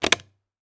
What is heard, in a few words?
Switch Plastic